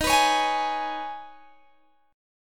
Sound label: D sharp 7th Suspended 4th Sharp 5th, Guitar, Open G